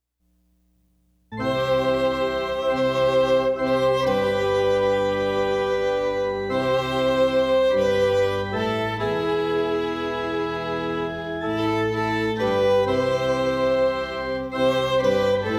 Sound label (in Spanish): Musica Instrumental